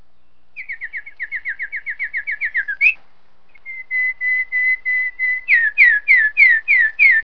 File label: bird